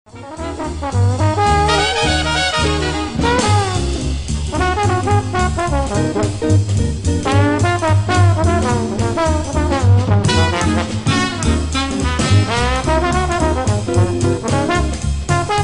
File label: Slidework In Ab, Duets, 2 Trombones